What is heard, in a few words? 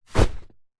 foley luggage rucksack backpack drop on grass